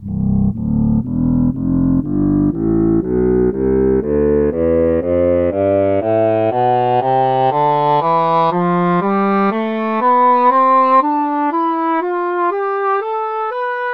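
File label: english horn scale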